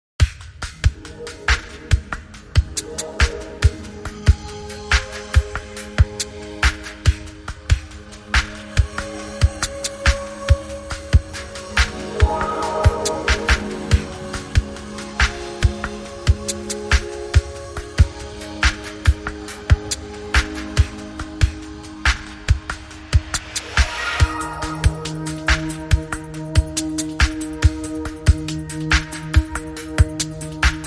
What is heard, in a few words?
No Synth Arp